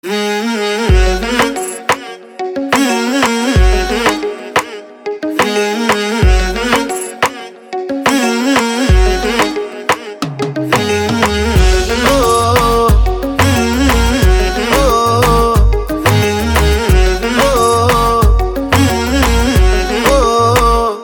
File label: Music Ringtone, Punjabi